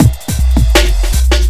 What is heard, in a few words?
Speed Loop Reggae Feel, Drums Beats